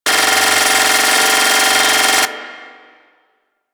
Emplacement Move Gun Loop; Grineer

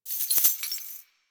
bird three body shake; ambience

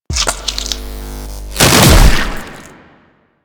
Melee Mid Spin Flesh; Warframe; Infested; Ancient

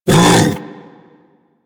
Predasite Vocal Tranquilized Grunt; Warframe; Hunting Critters

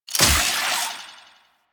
Speargun Reload; DE; Warframe; Weapons; Skins; Tn Hydroid Speargun

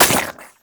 Grineer Chem Strike Nox Rifle Projectile Impact

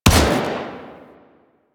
Burst Rifle Fire; Weapons; Grineer

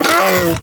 Predasite Vocal Pain; Warframe; Hunting Critters

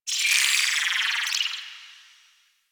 Glowing Tree Insect; ambience; props; one offs; Warframe; Venus Open World; Venus Landscape

